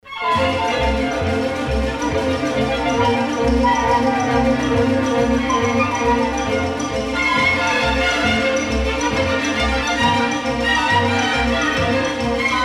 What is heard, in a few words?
Mexican Hat Dance, Pop Latin; Summer